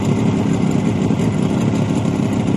150 hp Mercury Boat Idle Loop

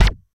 Gun Shot Silencer, silenced singles

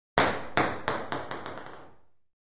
Ping Pong Ball Drop

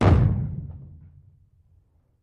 30 Feet Primacord

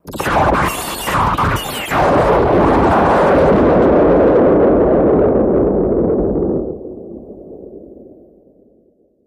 Ah Pedal Steel Effec, arcs & sparks

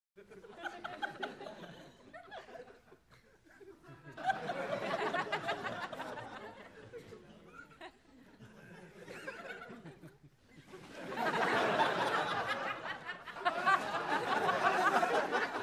Crowd 100 Subdued; medium int

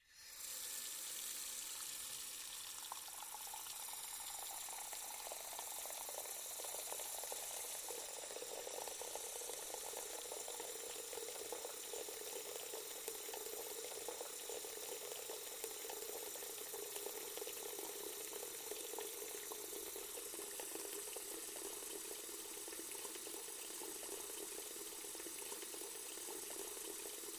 Sink Water Running Fi

